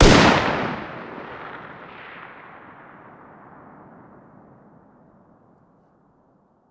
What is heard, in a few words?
fire dist 308 mg ext; gmod; arccw uc commons attachments